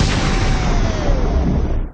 shotgun; BALLY

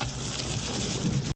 object falling; sfx

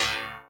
ting; BALLY; TWILIGHT ZONE; Pinsound; Twilight Zone Reorchestrated

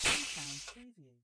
slingshot; BALLY; TWILIGHT ZONE; Pinsound; Twilight-Zone-Full-Reorchestration

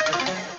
stunt; BALLY; CORVETTE; Corvette-Original

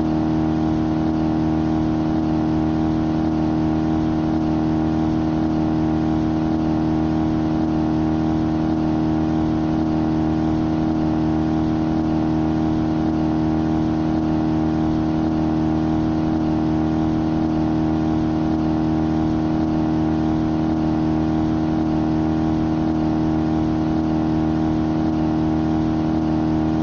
engine ambiance; BALLY; voice; CORVETTE

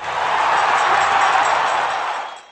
crowd winning

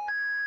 bip; BALLY; CORVETTE

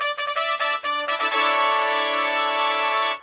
start mode; jingle; WILLIAMS; INDIANA JONES; Indiana-Jones-Original

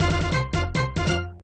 fanfare bonus; sfx; WILLIAMS; INDIANA JONES; Indiana-Jones-Original

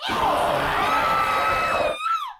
scream; BALLY; TWILIGHT ZONE; Pinsound

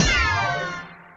phew; sfx

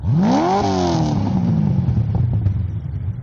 starter; BALLY; CORVETTE; Corvette-Original

